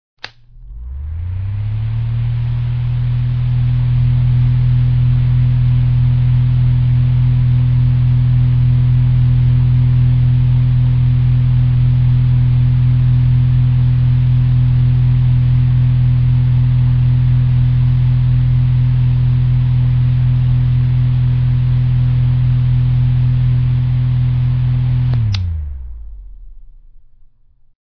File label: Fan